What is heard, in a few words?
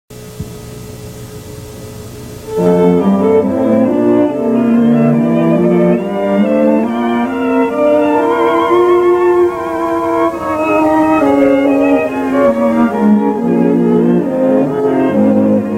String Quartet; Vaghy String Quartet; Ravel String Quartet Movement 1